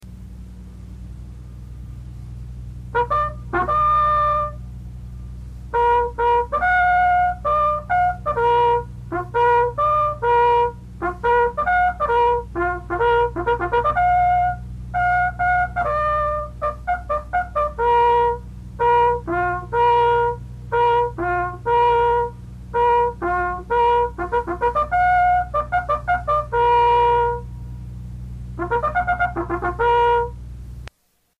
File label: Evening Colors, bugle calls